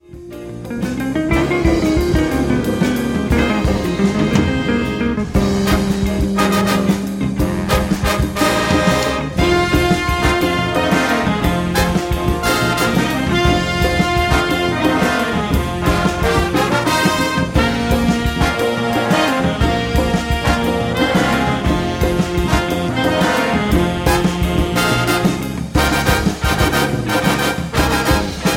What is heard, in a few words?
blues in latin